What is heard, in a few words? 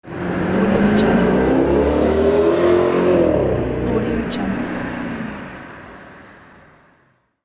motorcycle